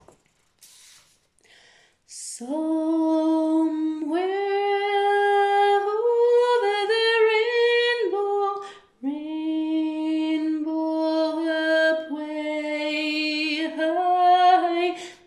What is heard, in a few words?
alto